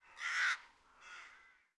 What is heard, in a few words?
ambient; World of Tanks